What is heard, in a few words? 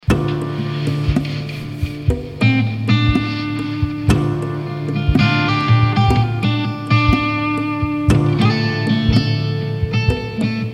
D 120 GTR PERC groove